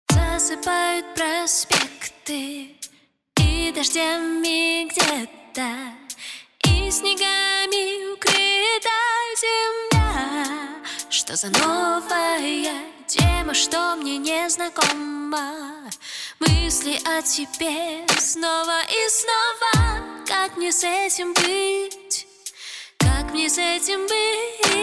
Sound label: Blues Duet